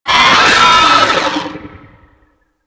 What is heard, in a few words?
Moa Charge Scream; Warframe